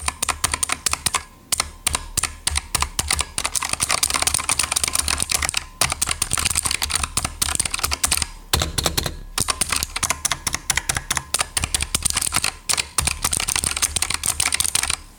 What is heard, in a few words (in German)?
razer clicky lila lautstaerke